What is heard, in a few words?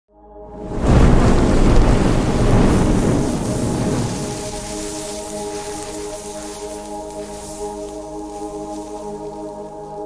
Particles